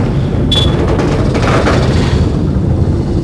tin; WhiteOut

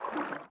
footsteps water

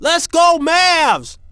MAVS, fan; NBA Inside Drive 2004

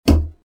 start, metal door; movers; doors; Soldier Of Fortune II Double Helix; XBOX

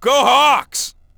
"Hawks", fan; NBA Inside Drive 2004

